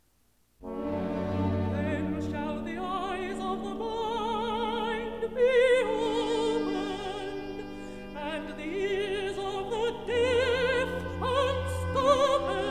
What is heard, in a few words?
Recit, Contralto; Then shall the eyes; Messiah